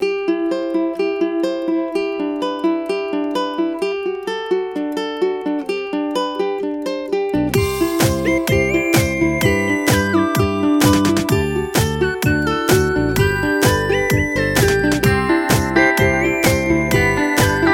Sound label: Happy Uke Tune